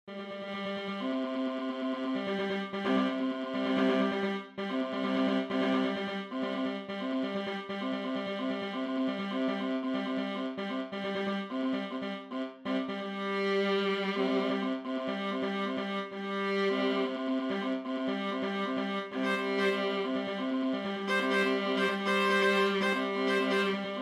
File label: piece perform midi; compose